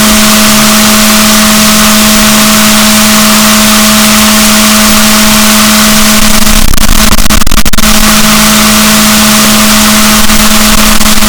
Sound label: rorquals negatif, boat; Balaenoptera Physalus Chile